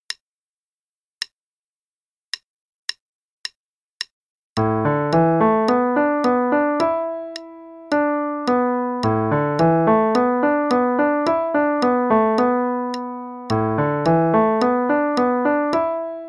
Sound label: Improv (qn=108); Faber; Level 2A page 32 Whirling Leaves